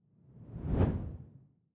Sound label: whoosh simple low; swooshes-swishes